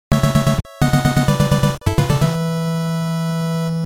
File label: stage end victory; contra